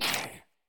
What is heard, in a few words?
Pick Up Skeleton